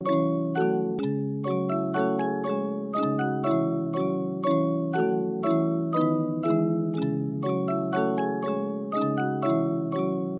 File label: lullaby